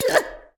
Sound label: hit; regretevator